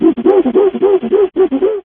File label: charge ape, Voices Emotes